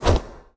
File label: Shield Sentry Sword; gamerip; Ruin